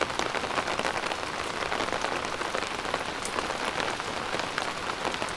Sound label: rain